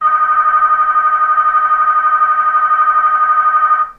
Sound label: slope car start